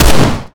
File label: fire, glock; Urban-Coalition; Decay; arccw ud